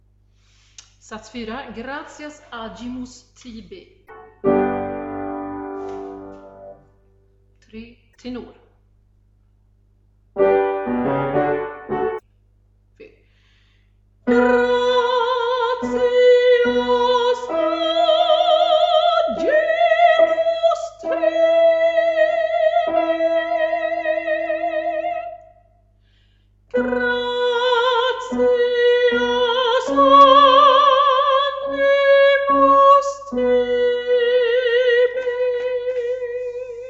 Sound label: Tenor; Gloria; Gratias